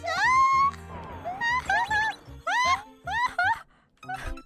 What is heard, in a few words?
dying noise; noises; Mario